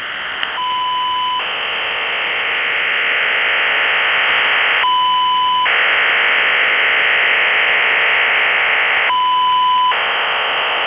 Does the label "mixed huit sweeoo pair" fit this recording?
No